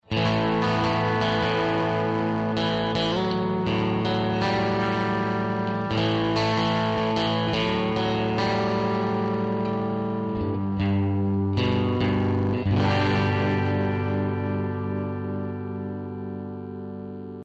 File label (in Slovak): Tweed B man akoze Red hot